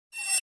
Alert Beep Sci Fi

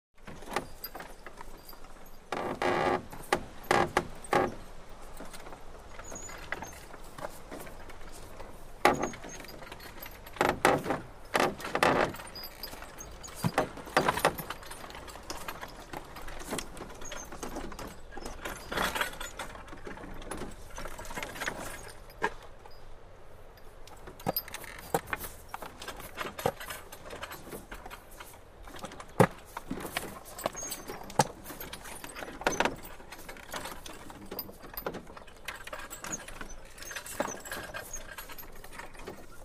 Carriage Idle 1 Very S, carriages

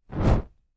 Torch Movement; torches

